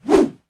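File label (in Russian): сильный мощный замах сабли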